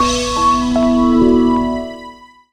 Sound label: Stepper Keys; Jungle; Blu Mar Ten - Jungle Jungle - 1989 to 1999 Samplepack